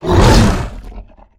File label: Exertion Light; Evolve; Behemoth